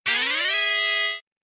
New Toa Acquired; Voya Nui Adventure; Flash Games; Game Music

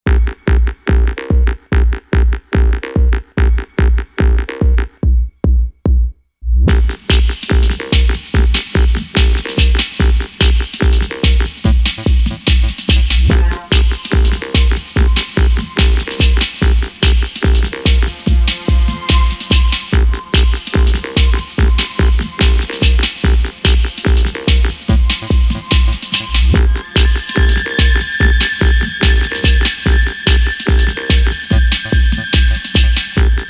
Techno; Glissando; Techno Snowboard; The Legend of Mata Nui; Console Games; Game Music

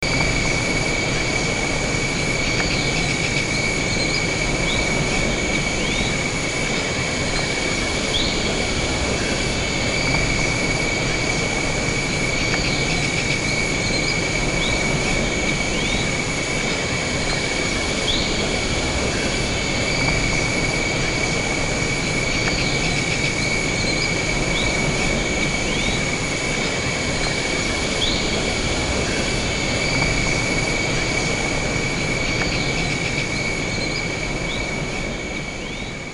Mata Nui Ambience; The Legend of Mata Nui; Console Games; Game Music

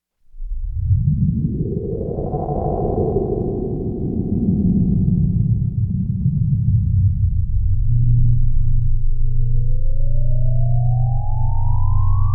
Ambience; Heatstroke; Tahu Ambience; The Legend of Mata Nui; Console Games; Game Music

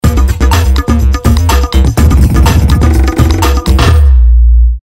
Gali Fanfare; The Legend of Mata Nui; Console Games; Game Music

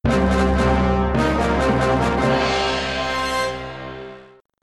Level Complete, Game Music; Inika Island Assault; Flash Games

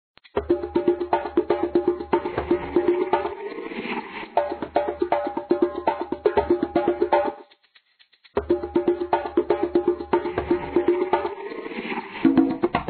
Drums; Piraka Attack Drums; Flash Games; Game Music

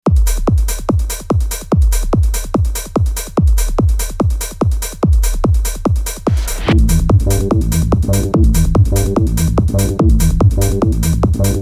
Techno Snowboard; The Legend of Mata Nui; Console Games; Game Music

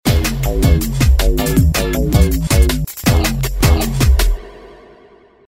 Fanfare; Lewa Fanfare; The Legend of Mata Nui; Console Games; Game Music

